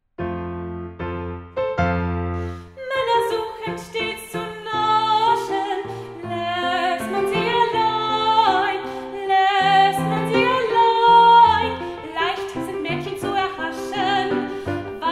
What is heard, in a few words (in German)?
Gesang; Mozart Warnung